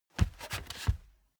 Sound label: step barefoot wood plank scuff; Footsteps; schritte